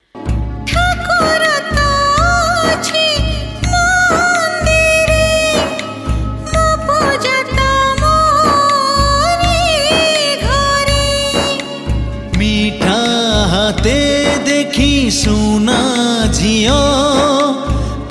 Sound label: sad version, Odia Song